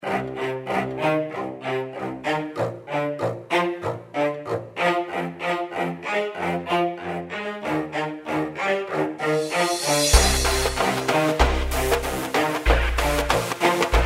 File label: reggaeton